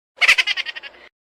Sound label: short chipmunk laugh